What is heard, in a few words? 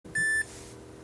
single beep; PX4 Autopilot